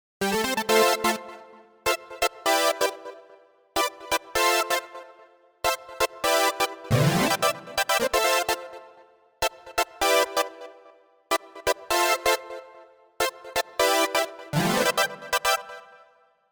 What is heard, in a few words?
Funk Brs solo